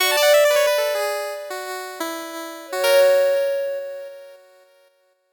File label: 8bit jingle normal